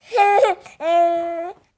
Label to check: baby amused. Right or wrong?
Right